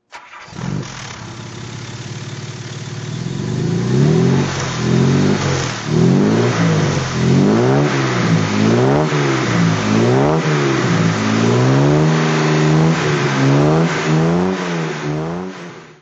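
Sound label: jaguar mkII 38aut throttle, Engine sound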